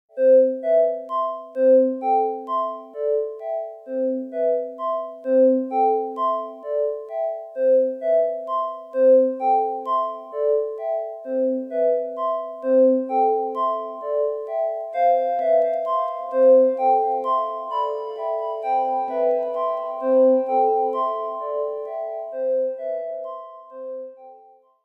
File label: Nokia Ringtones; Nokia E90 Original Ringtones